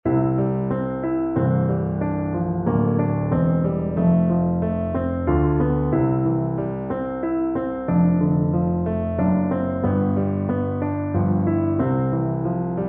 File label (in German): Oboe Solo + Klavier-Playback; Zum Sanctus (Heilig heilig)